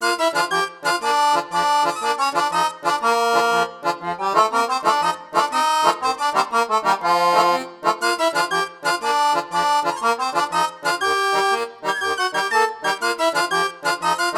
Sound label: Ditty; The Jackbox Party Pack 6; Jackbox Games